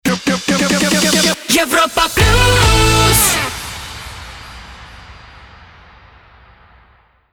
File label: Jingle Rapid Fire; Europa Plus